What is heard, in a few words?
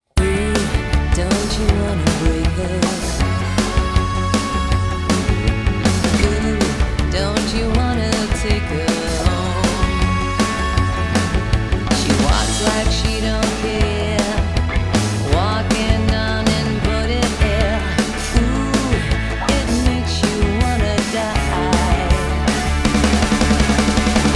Vocals Down Mix